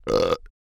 burp; OPENAIR; Anechoic

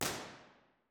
dixon studio theatre; b-format; university york

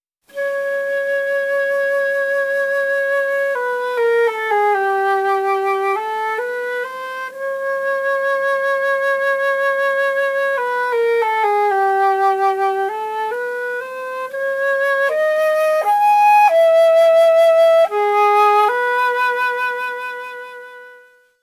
Orchestral NOISE, Anechoic; OPENAIR

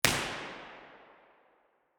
typing, room; terrys; omni

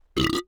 burp; OPENAIR; Anechoic

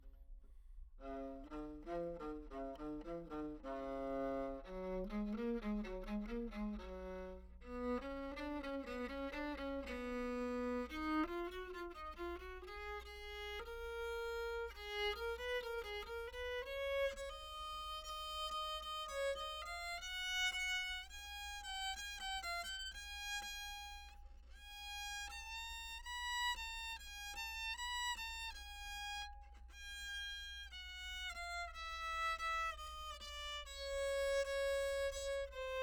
exercise, treble viol, Anechoic

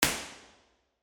stairwell; university york; ortf

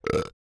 burp; OPENAIR; Anechoic

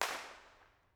across water, creswell crags; b-format

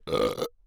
burp; OPENAIR; Anechoic

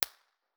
koli snow, koli national park winter; 1 way mono

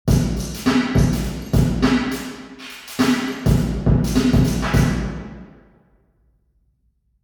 impulse response church drum; heslington

